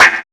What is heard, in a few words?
Snares, Rimshot